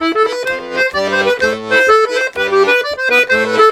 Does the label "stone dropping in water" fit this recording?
No